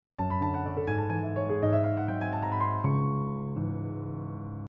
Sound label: ringtone; Chopin